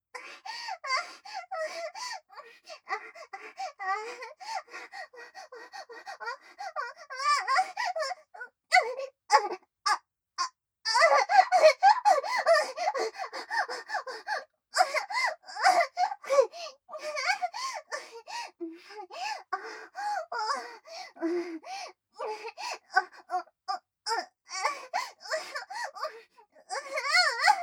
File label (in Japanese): あえぎ声 後ろ向き